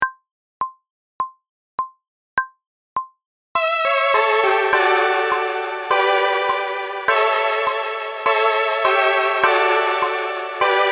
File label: Synthe